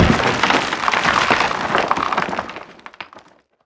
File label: rubble; Call of Duty Modern Warfare